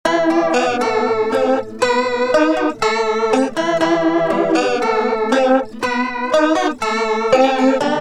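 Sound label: acoustic guitar mistress original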